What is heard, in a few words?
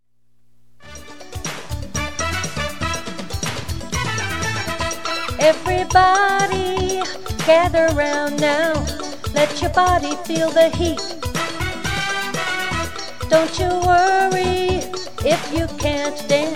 Conga